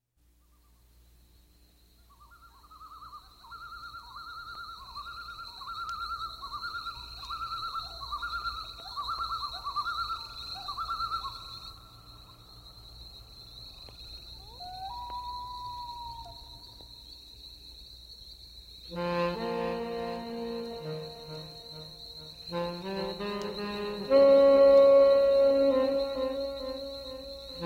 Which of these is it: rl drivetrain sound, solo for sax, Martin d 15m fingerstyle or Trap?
solo for sax